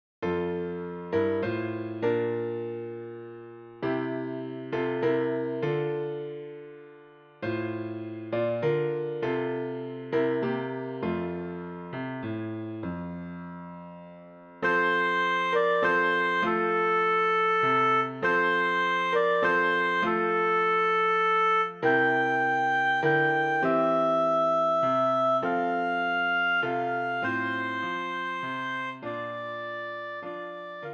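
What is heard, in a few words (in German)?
Stille Nacht heilige Nacht Oboe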